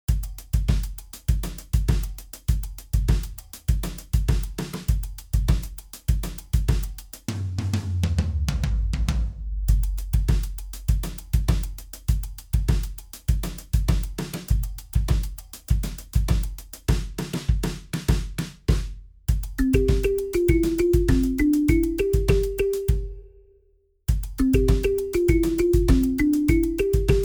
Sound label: balafon; ST THOMAS Balafon Vx 4